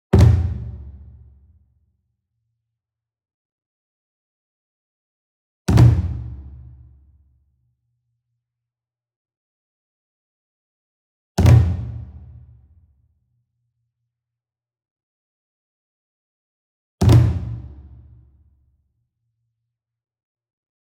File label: ENSEMBLE DRUM Flam Medium; boom library; Cinematic Strikes Construction Kit